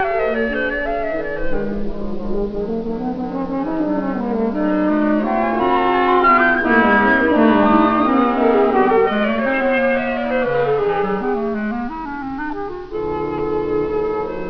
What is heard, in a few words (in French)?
SEXTUOR A VENT; CRYSTAL RECORDS; vinyl